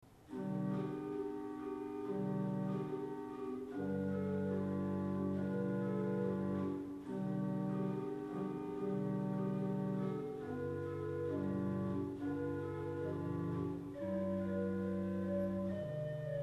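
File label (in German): Gedackt 16, Orgelstimmen; Orgelstimmen CD1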